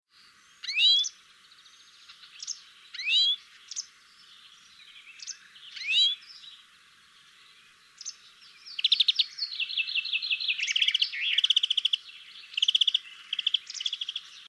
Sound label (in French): verdier d europe